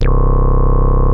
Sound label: HITEC C1, ANALOG BASS; Music Store - Claudius Diemeter Schwerlos